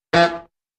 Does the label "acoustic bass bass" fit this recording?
No